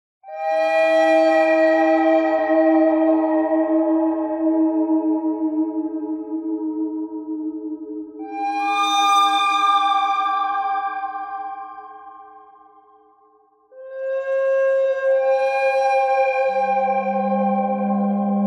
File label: bird thread noise all; birds